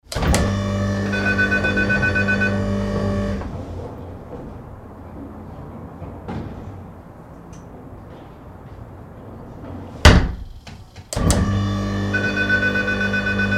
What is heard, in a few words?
Door Alarmed Open Close, Exterior motorized security door; Exterior motorized security door open and close with bleeping; Miscellaneous Door; Tom Hutchings